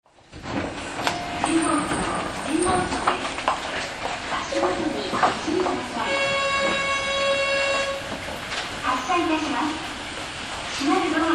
走行音, 500050系; Tobu; 神保町→大手町; 地下鉄向けに保安面での改造が施されています。; 英語放送

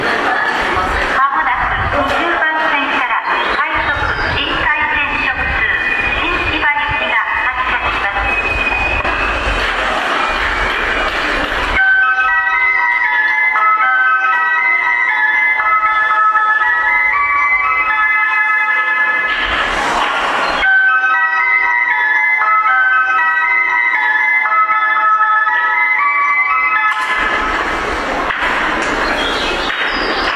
新たな季節, 主に川越線からの列車が使用します。; 埼京線

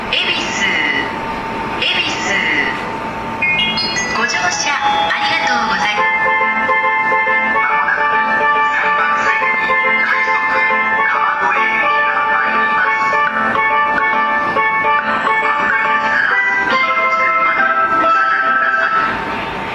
恵比寿駅, 埼京線・湘南新宿ライン; 山手線; 渋谷・新宿方面

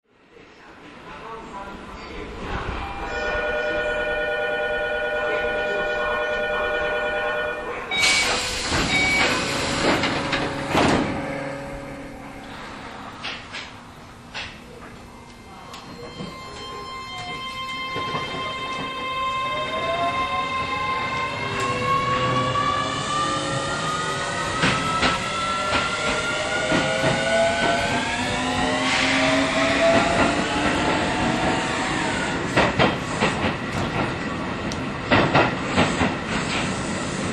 VVVF車 高幡不動→南平, 走行音, 改造後のインバーター車両です; Keio; 7000; 1984年に登場した車両で京王線の列車の中では古いほうの部類に入ります。

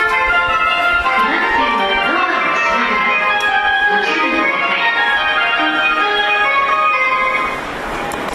発車1音色c, 恵比寿駅, 山手線; 品川・東京方面; GK製です。

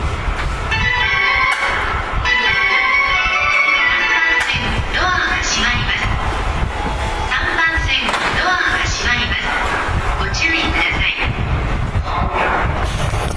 発車4音色a 打ち返ししています。, 恵比寿駅, 埼京線・湘南新宿ライン; 大崎方面; GK製

